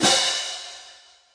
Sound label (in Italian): Piatti, Roland TD 7; DRUM MACHINES